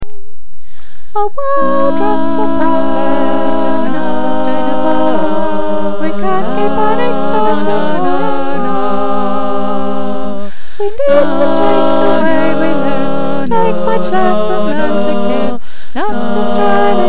no more shopping tenor

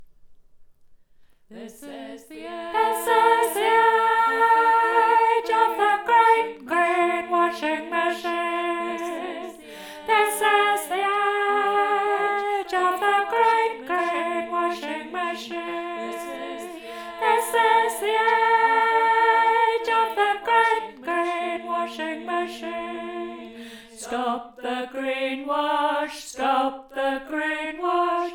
great green washing machine high

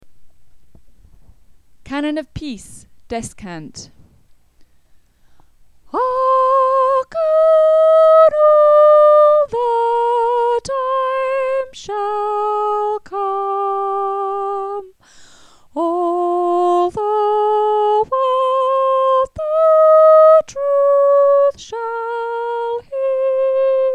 Canon of Peace descant

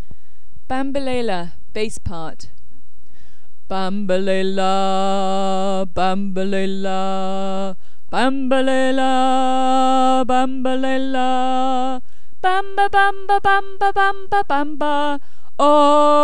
Bambelela bass

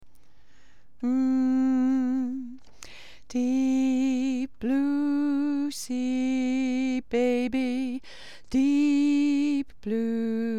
tenor

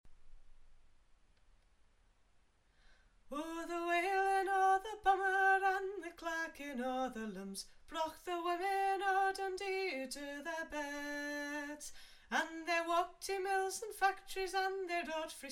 Dundee alto

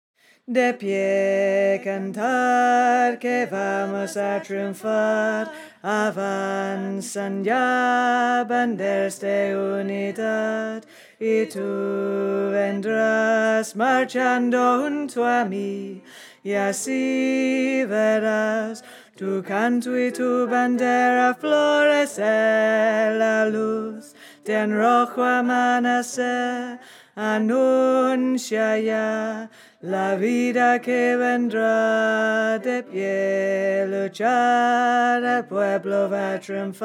tenor

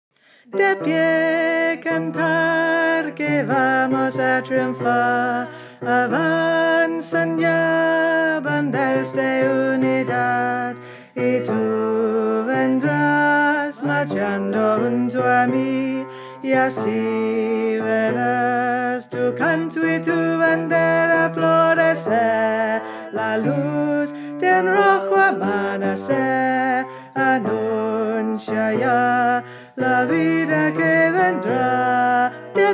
bass

